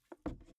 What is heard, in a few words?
briefcase plastic creaky inside pocket; industrie wirtschaft; bueroarbeit